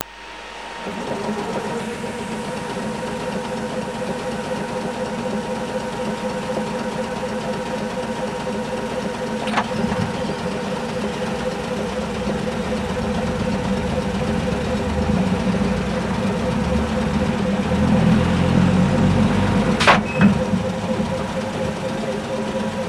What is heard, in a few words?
3102 Compressor & False Start; The Sound of Legends Part 3